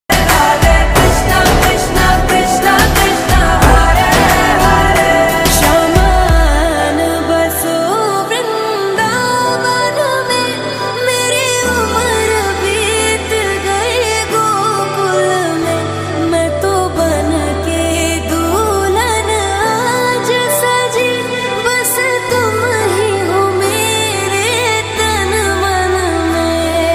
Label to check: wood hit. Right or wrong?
Wrong